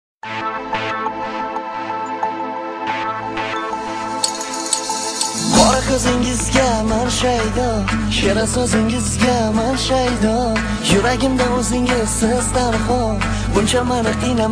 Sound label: Uzbec Song